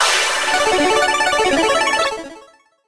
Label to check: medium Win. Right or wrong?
Right